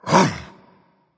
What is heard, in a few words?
grunt jump berserker; cuchulainn